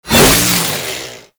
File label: Chain Saw Tonfa Whoosh; Warframe; Grineer